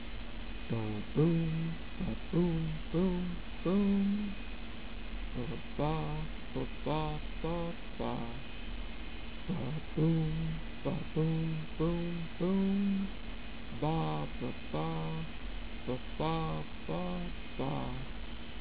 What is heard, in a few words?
Rhythm